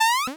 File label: Cry